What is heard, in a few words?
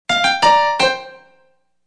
Alert